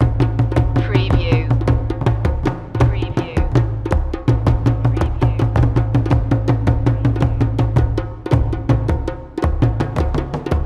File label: african drums bugobogobo fusion loop